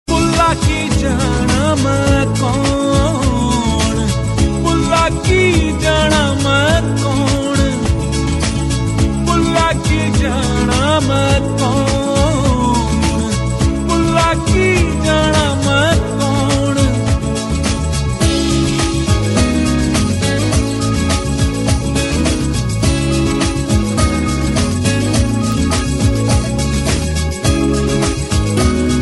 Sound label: Ringtone, Punjabi Pop